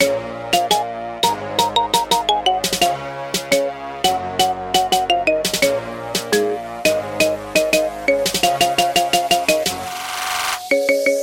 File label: Marimba